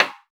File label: Wadaiko Rim, Taiko, Percussion; Roland BK-5